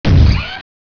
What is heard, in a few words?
gunner run step